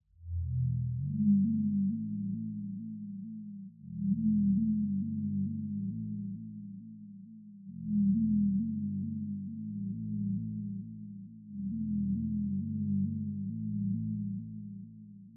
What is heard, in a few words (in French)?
basse break